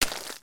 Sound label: Foot Mud Walk Light, footsteps